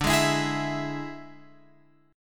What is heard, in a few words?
D Suspended 2nd Flat 5th D, Guitar, Open F; x,9,8,11,11,11; strummed